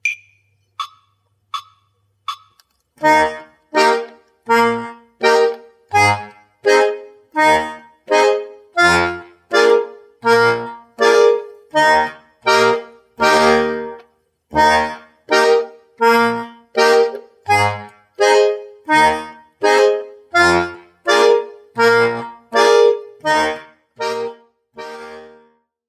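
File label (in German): Begleiten in Es Dur Übung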